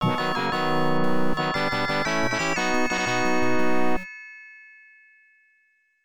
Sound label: Floor Clear Music; The Return of Ishtar; Fujitsu FM77AV